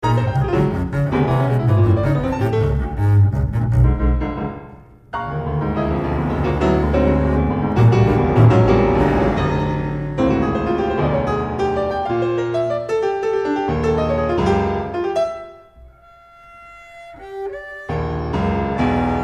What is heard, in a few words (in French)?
duo, Contrebasse piano